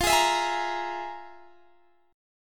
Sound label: F Minor Major 7th Double Flat 5th; FmM7bb5; strummed